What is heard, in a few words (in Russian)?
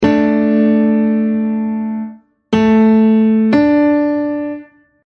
kvarta